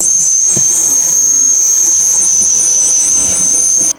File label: high pitched cartoon fly buzzing